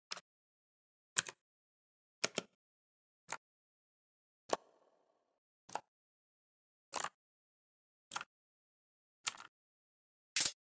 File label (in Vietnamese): tong hop tieng click chuot may tinh